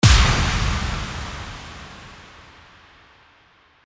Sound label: IMPACT